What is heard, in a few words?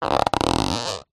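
leisure inflated rubber toy stress squeak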